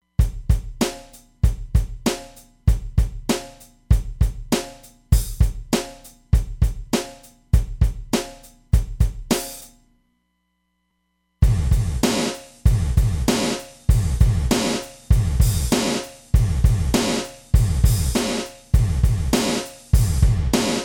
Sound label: Pan Gate, Roland DEP-5; Factory Patches